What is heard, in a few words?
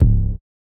Kick